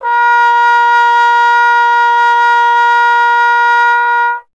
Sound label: Trombone A#0; Miroslav Vitous; Solo Instruments